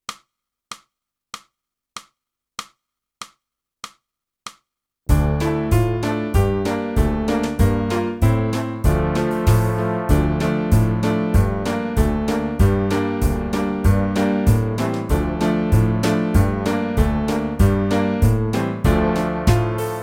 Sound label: clarinet; boosey method